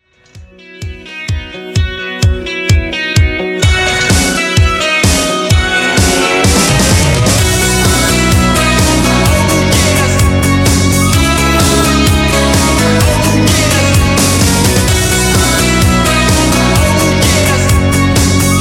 Party Intro 128Bpm

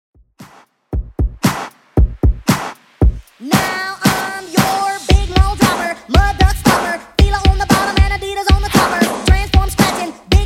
80s Old School Redrum 115bpm

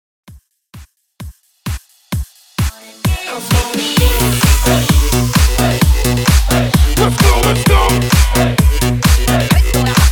130 BPM CLEAN

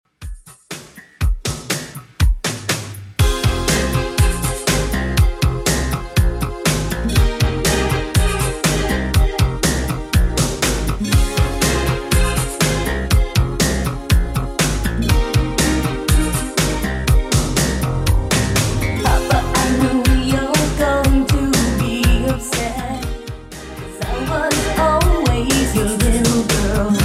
80s Redrum 121 bpm

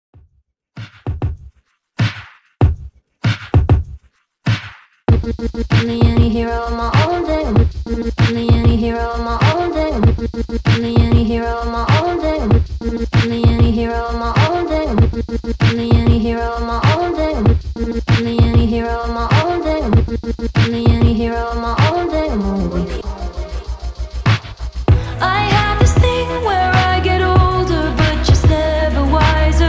Top40 Intro Break, 97 Bpm

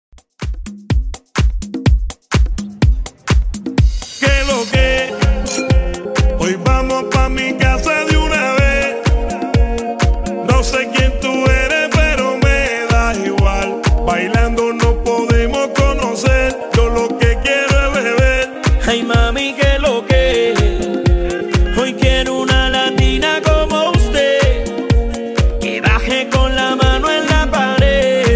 Latin Redrum 125 Bpm

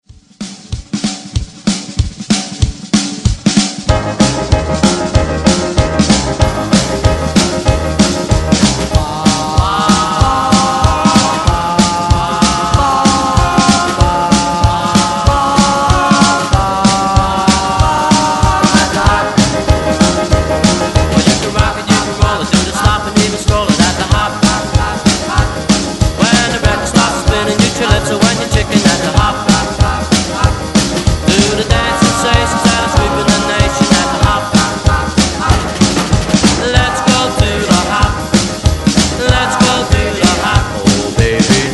50s Re Drum 95 Bpm

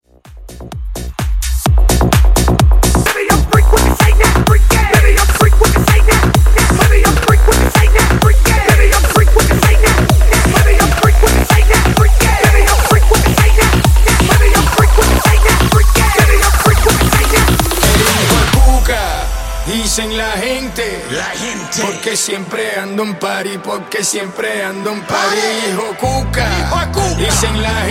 Funk Bootleg 128 Bpm

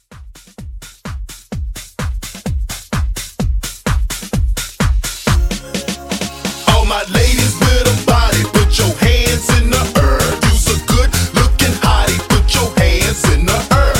Dance Hype 128 CLEAN

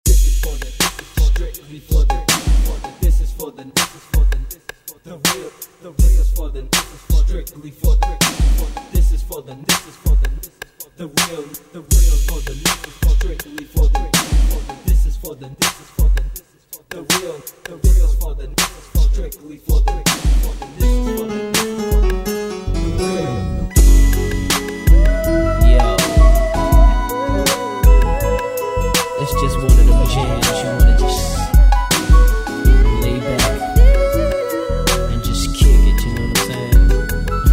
Straight Kutz; DJ Mhark

